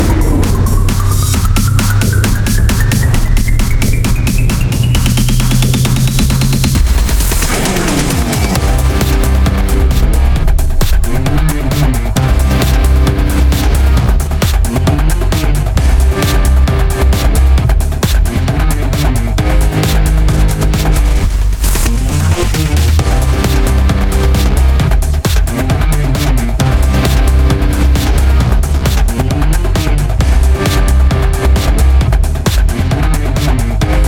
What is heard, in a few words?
Chase BGM Mix; Indian 2 Chase BGM Mix; without dialogues and disturbances